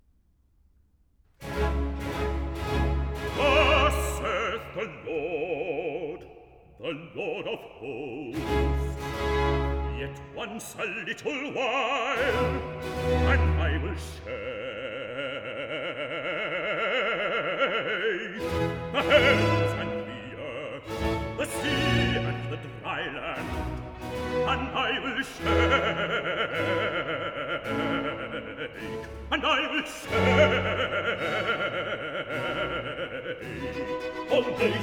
Recitative; Thus saith the Lord of Hosts; HANDEL; MESSIAH

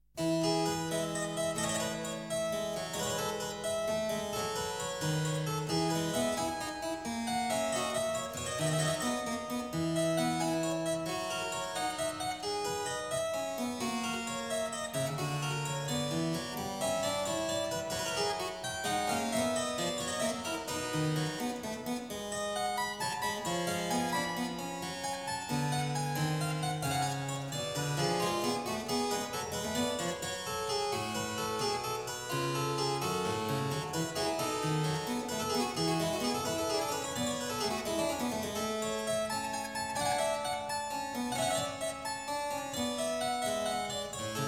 Prelude in E major, clavier; Prelude & Fugue No.09; BWV 854; BACH; WELL TEMPERED CLAVIER; BOOK I

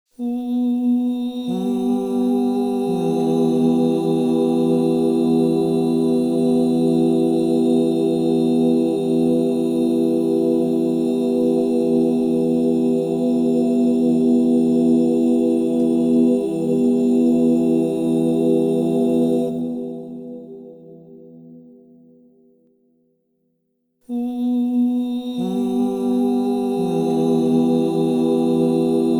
Mi minor, crystal bowls; Yoga and sound

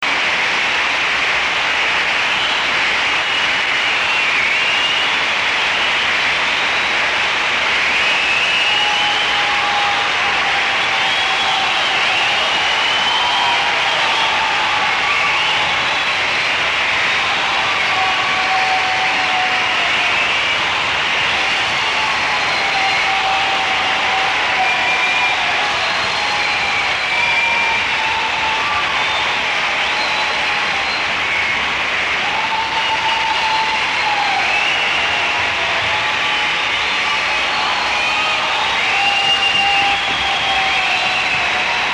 Applause; 1972

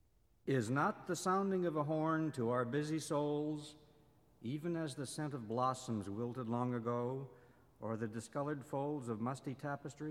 Sonata in E flat for Alto Horn and Piano; HINDEMITH; The Posthorn (Dialogue)

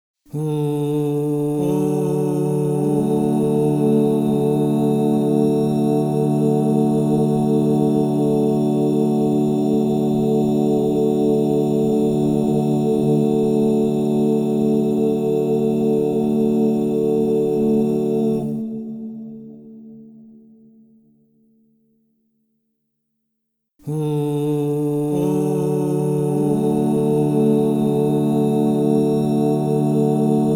Re major, crystal bowls; Yoga and sound

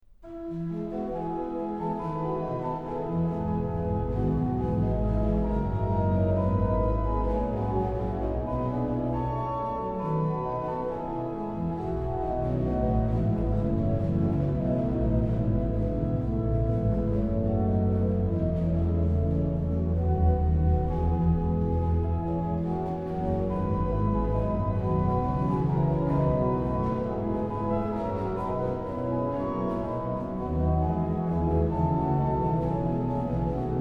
Herr Jesu Christ, dich zu uns wend, organ; BWV0632; BACH; BACH ORGAN WORKS; CHORALE PRELUDES

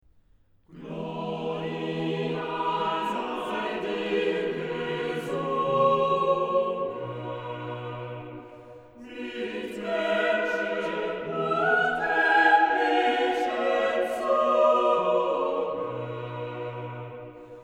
Chorale, BACH ORGAN; Chorale Preludes; BWV0140; Gloria sei dir gesungen

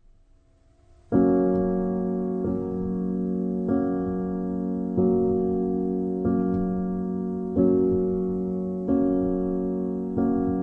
Bell Tower, ambient

